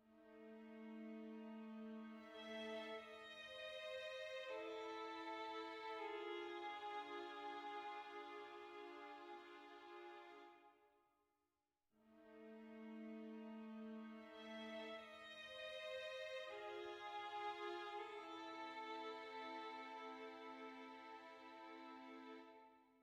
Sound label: dark soft